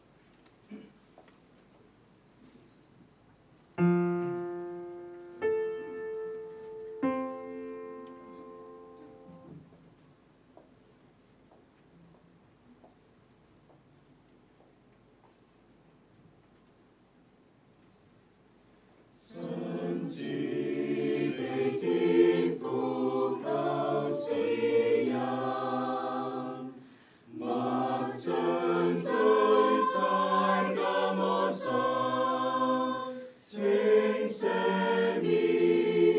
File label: choir; worship